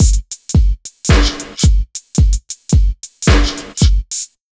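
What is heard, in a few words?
110 beat, Ragga & Dub Reggae; USB Soundscan vol.27; AKAI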